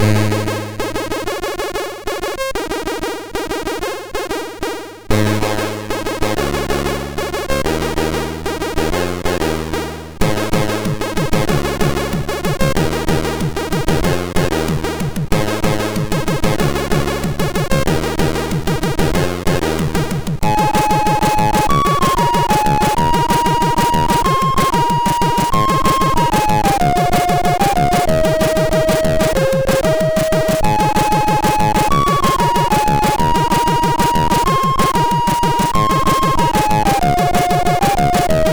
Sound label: Champ,sid MOS6581R2